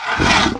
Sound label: attack